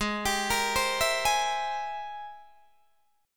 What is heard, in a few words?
G sharp Augmented Major 9th G sharp Guitar Open D